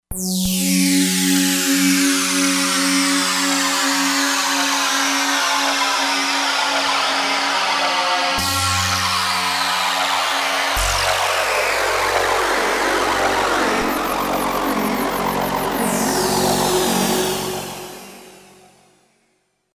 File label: Squash, synth